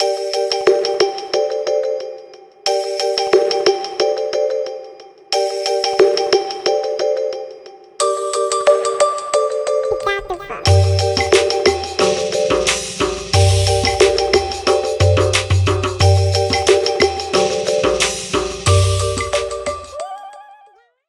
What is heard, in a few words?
ringtones; Samsung; Galaxy A03 Core